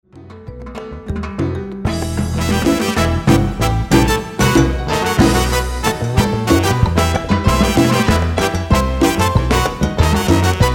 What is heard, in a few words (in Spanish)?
Banda Salsa Cubana